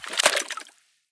riffle drop puddle; STALKER; IWP

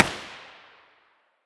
muzzle g36 mono far, weapons

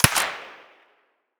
svd silencer mono distant

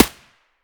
aps silencer mono distant; STALKER; IWP

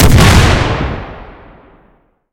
dynamit; STALKER; IWP

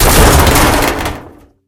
hit hard, barrel collide; STALKER; IWP

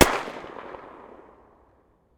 tt33 muzzle mono far

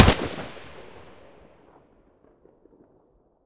ak74u muzzle mono; STALKER; IWP

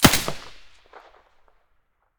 sa58 silencer mono

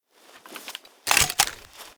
rgm40 reload

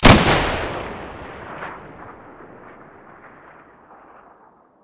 muzzle sv98 stereo

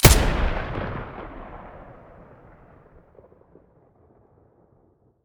hk416 muzzle stereo

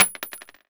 shell 9mm wood; STALKER; IWP

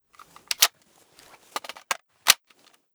mp5 reload, weapons

